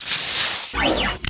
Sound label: reload, boltgun; weapons; Dystopia